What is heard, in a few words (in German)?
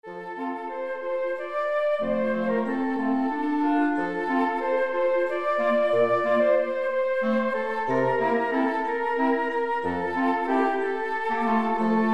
blaeserquintett